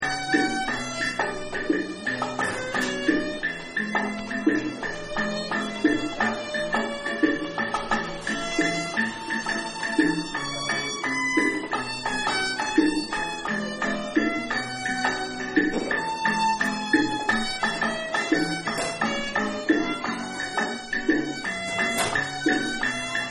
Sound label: Violin